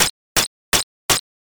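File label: footsteps wet road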